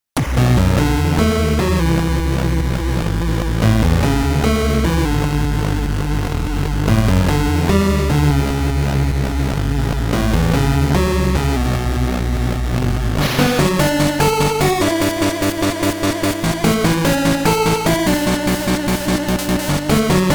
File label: jingle; weekly beats 2020